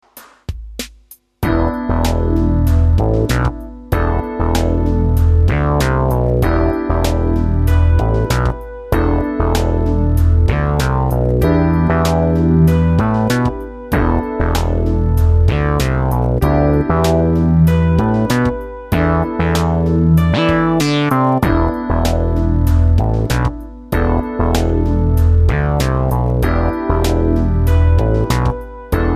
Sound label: analog filter